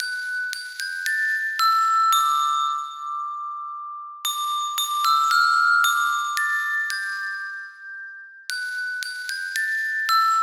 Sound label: Puzzled Underscore, Classical Quirky; Narrative Mind